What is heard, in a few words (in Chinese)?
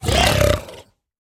猪灵蛮兵死亡声